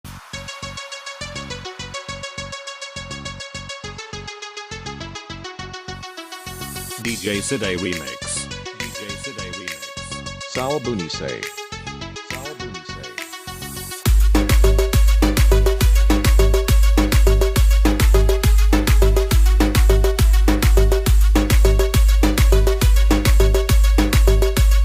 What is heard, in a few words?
DANCE MIX; NEW STYLE